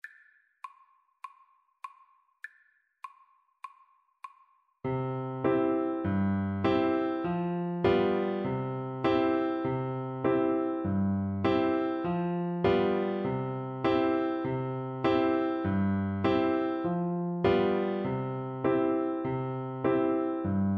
Oboe

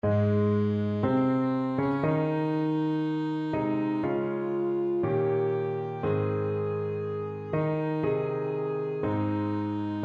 saxophone

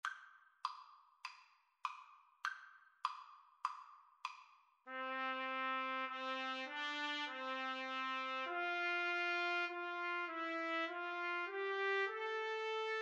trumpet duet

